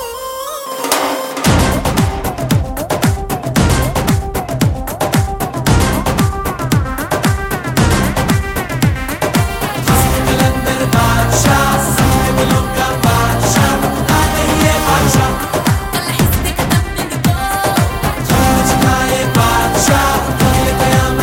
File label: Ringtone